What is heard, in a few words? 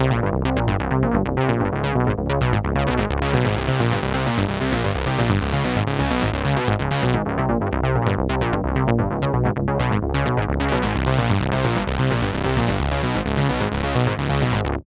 MODULATD FILT, BASSLINES 130, Tekkno; Masterbits - Tekkno Trax